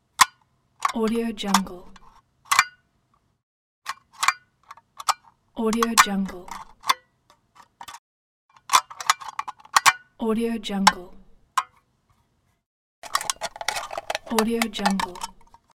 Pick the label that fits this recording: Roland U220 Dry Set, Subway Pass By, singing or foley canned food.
foley canned food